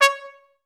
TRS2STAC C4, Advanced Orchestra; Best Service

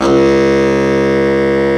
BARITON SAX

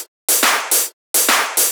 Shuffled Loops, 140 BPM; Vengeance - Essential Clubsounds Vol.4

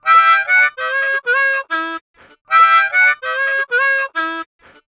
Harm 098bpm A, Harmonica

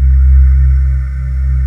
VOICES C2, Choir; USB Soundscan vol.28 - Choir Acoustic & Synth; AKAI

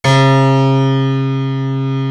JD piano

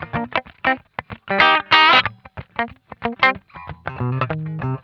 Funk Guitar; Zero G - Funk Guitar

